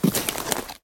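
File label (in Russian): быстрый звук шуршащих вещей вытаскиваемых из сумки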